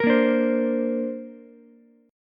A sharp Major Add 9th, Ukulele C6; strummed

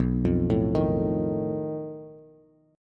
B 7th Flat 5th B Bass; B7b5 arpeggiated; JGuitar

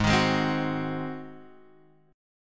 G sharp 7th G sharp, Guitar Half Step Down

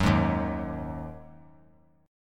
D sharp Minor Double Flat 5th; strummed; chord; x,13,11,13

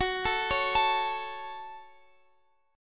F sharp Minor F sharp, Guitar Drop D

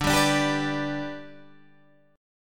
B-Minor Sharp 5th

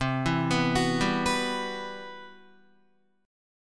C Major Sharp 11th, Guitar, CM#11 chord; CM#11 arpeggiated; JGuitar

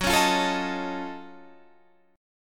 F sharp Minor Major 13th, Guitar, Standard; strummed

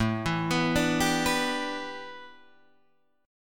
A 7th Suspended 2nd Suspended 4th Guitar DADGAD

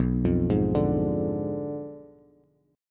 B Diminished 7th B Bass; Bdim7 arpeggiated; JGuitar

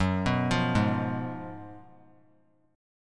F sharp Major 7th Suspended 2nd Suspended 4th; F#M7sus2sus4 arpeggiated

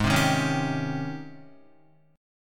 G sharp Major 11th G sharp, down, Guitar; strummed; chord

